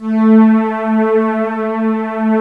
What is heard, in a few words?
ANALOG STR, Strings